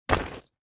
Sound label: Coffee Ground